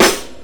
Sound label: boom bap hip hop snare drum e key